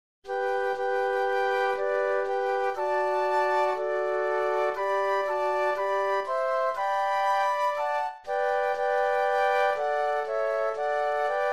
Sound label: Flute Trio; christmas